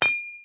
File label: Metal